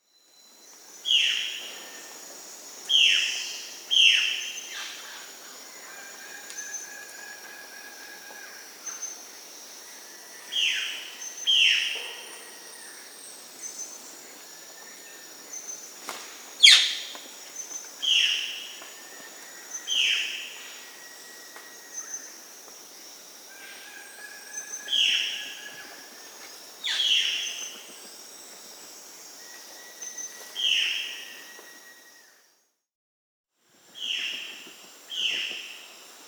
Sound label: Violaceous Jay (Cyanocorax violaceus), Peru, Birds of the Amazon; S. America